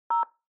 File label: Telephone DTMF, 3